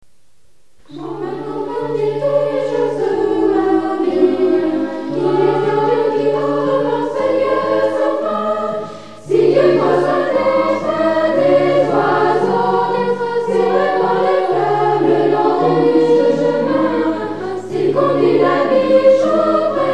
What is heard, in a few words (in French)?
chant si dieu prend soin des petits oiseaux